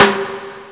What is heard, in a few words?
Clang Snare REV; Urban Shakedown